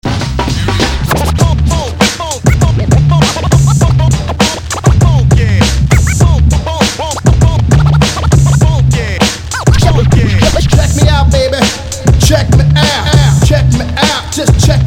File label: funky